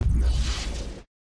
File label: Raygun Explosion; criminality